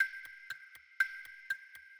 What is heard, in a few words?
rank timeout; CrossCode; battle